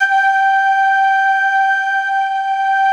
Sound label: ST ORG, STRINGORGAN, Yamaha VP-1; Music Store; 90's Sample CDs